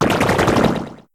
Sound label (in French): Cri